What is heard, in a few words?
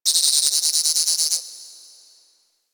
Ocean Critter Insect; Warframe; Grineer Ocean